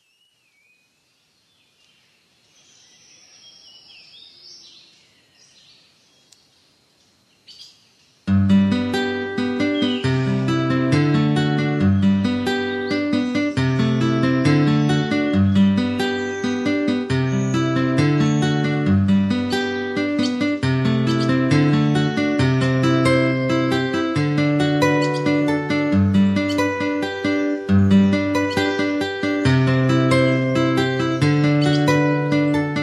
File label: i feel wind on my skin; relax with nature